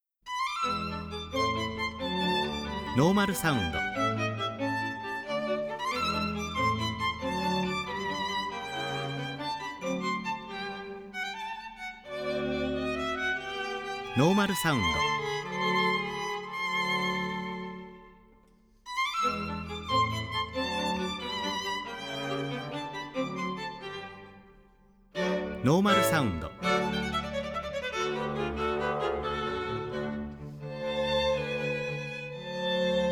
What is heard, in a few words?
strings